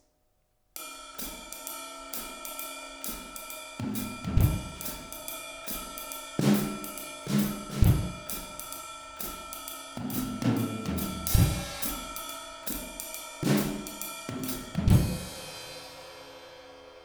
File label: 4 Piece 1 Mics, drum set